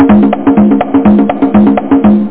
Drum Play

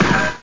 Cannon; Raid

